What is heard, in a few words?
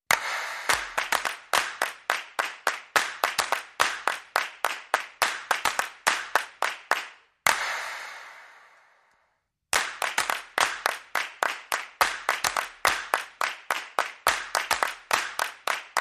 Claps Only